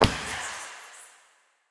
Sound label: Footstep; Smite; god Izanami